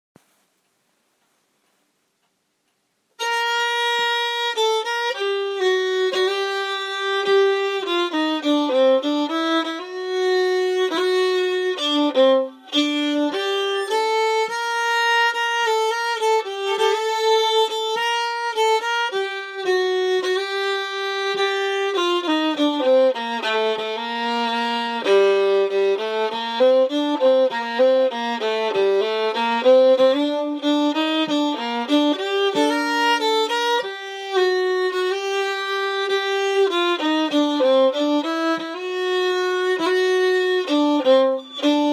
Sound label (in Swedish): Jarvsopolskans nya stamma